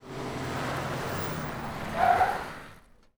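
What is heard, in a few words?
Lincoln SpinTire, Car Skids; Transportation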